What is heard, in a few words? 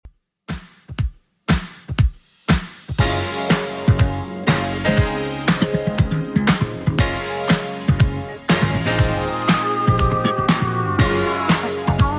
80s Redrum 120bpm